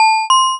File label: notification